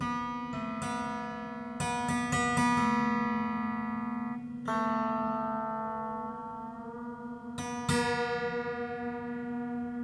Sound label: Detune, Piano; Piano Destruction